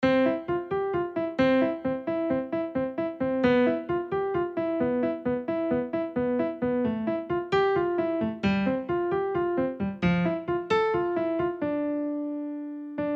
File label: Piano Theme